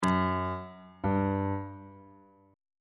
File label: f sharp 1st interval pitches on bass clef